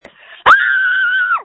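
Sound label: Scream, phone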